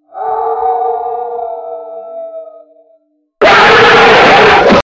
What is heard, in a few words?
howl roar; Halloween